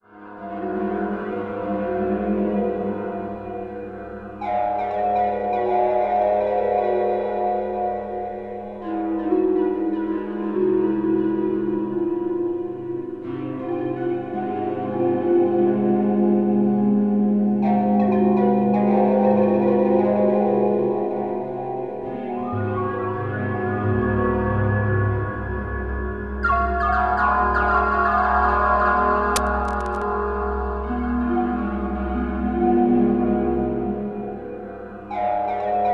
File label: spooky; Zero G; Malice In Wonderland